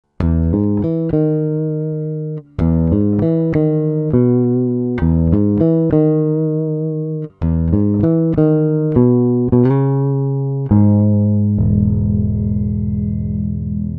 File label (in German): mittenboost erst p dann j pickup